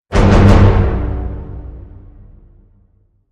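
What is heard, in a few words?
Hit Orchestra Tutti Triple G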